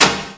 clicky things, Clicky Gadget Things

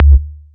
tube up kick

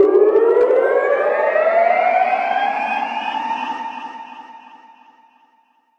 Uplift fx; drums; hip hop; STYLE KITS (Hip Hop, Etc); Khezie Hip Hop Kit

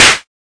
melbourne bounce Clap; STYLE KITS (Hip Hop, Etc); D3ron Melbourne Bounce Kit

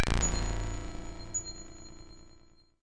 vibra blast ping ping; Electro and Synthetic

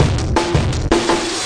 live superdistort, drum loops and breaks, 165 bpm

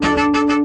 orchestra string hit D

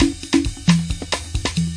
126 bpm mild conga n rides

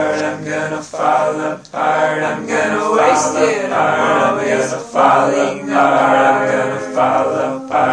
120 gonna fall apart male harmony; VOCAL OR VOXY LOOPS

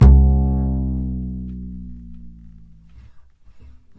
upright bass bombdive; Basses

